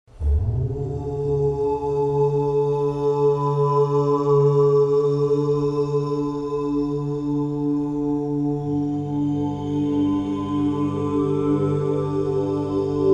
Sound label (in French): OM Harmonisation